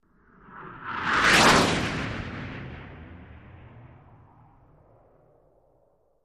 Airplane Bys Jet Prop, av-8b-harrier

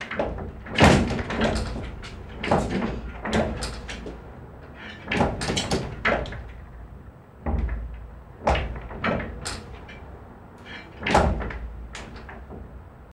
Elevator Door Clank

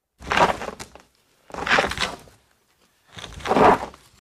Wood Scrape On Gritty

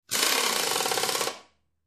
Grinding Air Wrench, air tool

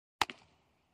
Baseball Mitt Impact

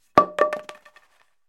Baseball Bat Wood Dr; baseball fx & games

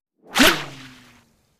Bullets Slice Water

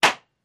Balloon Deflating Ra; balloons

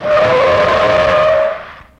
Car Skid Big

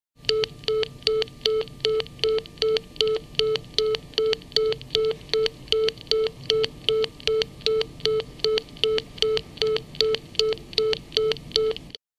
Futzed Busy Signal

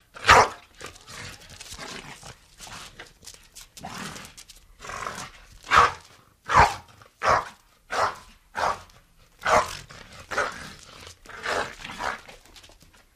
Pit Bull Bark Growl

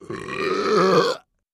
Med Character Belc; belching

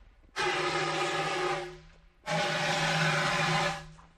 Extinguisher Blasts; fire extinguisher

